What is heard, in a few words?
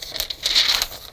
page